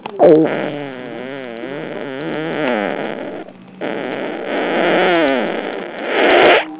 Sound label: static